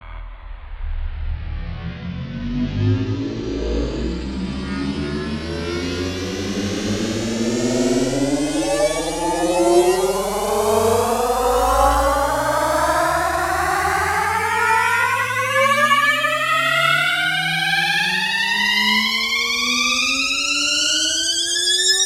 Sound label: Rises; neuro; Optiv & BTK